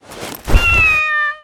catgun deploy, weapons

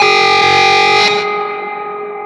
siren; 2fort

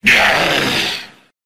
death; corpus

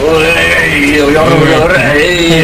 cry

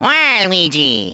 battlecry; waluigi

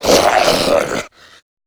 zombie vox male runner run front; rave in the redwoods

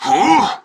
effort; tf

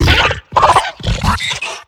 minion run chatter; tf; slender; attack of the radioactive thing

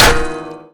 chargebow, weapons

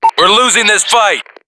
lose; payday

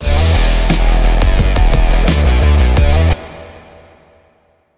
guitar; axel nosferatu

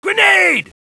grenade

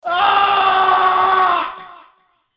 scream

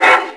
breadmonster idle; tf2pets

